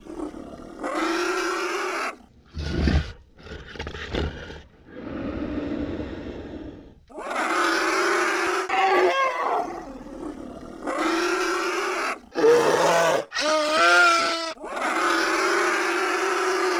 Lizard Creature, Vocals - Monster; Sony Pictures Sound Effects Series

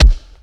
Mega Body Punch, Hand To Hand Combat; Sony Pictures Sound Effects Series

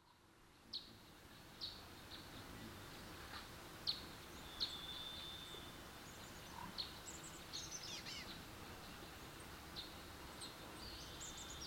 Ambient; Age of Empires III; cinematics

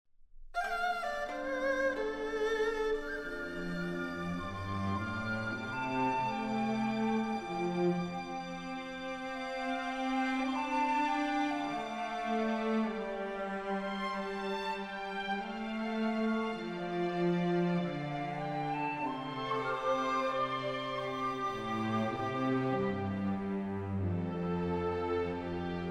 Music

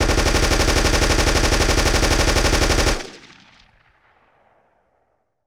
Sub-Machine Gun 9mm Long Burst; Weapons & Firearms; Sony Pictures Sound Effects Series

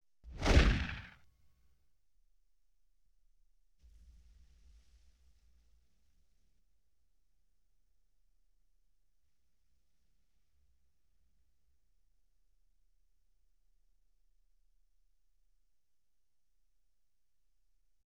Explosion; Sony Pictures Sound Effects Series; Explosions - 5.1 Surround

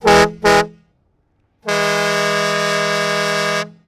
Big Rig Horn Blasts; Sony Pictures Sound Effects Series; Period Vehicles

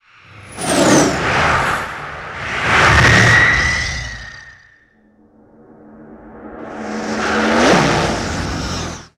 Super Jets Overhead, Sound Design - Sci-Fi; Sony Pictures Sound Effects Series Volumes 1-10; Sony Creative Software

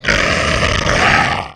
Super Snarl, Vocals - Monster; Sony Pictures Sound Effects Series

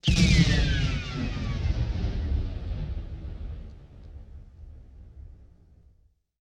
WWII Explosion With Flare; Period Combat; Sony Pictures Sound Effects Series

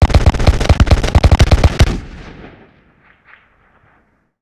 Musket Volley Close; Period Combat; Sony Pictures Sound Effects Series

